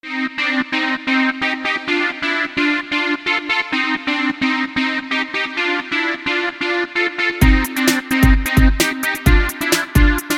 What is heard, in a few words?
Old School Breakbeat Ringtone